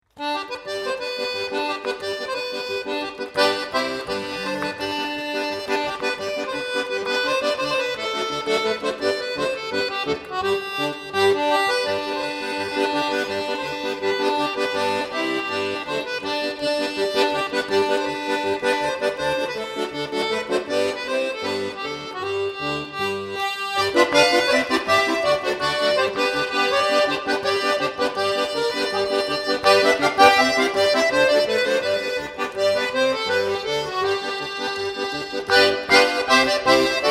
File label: solo accordion